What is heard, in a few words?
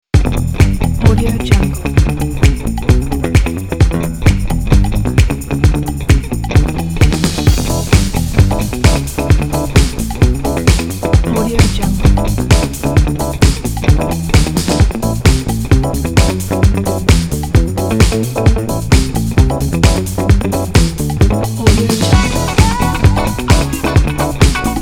funk